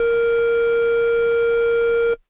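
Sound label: Air Bass Kawaii A#1; keys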